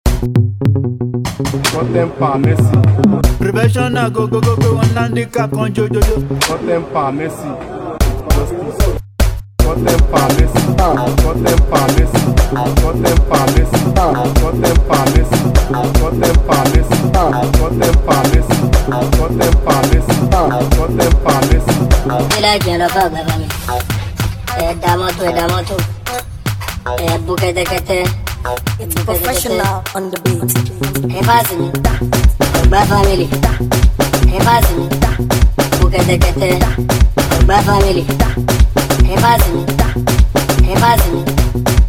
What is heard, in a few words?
Professional Beat